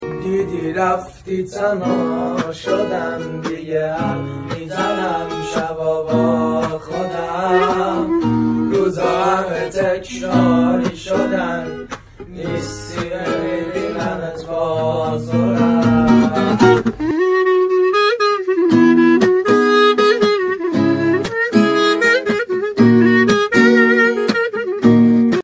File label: Guitar